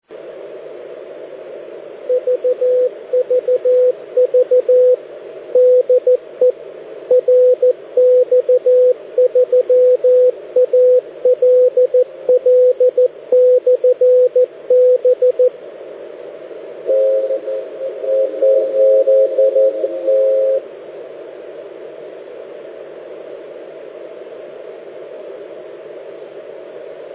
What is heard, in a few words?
28185.0kHz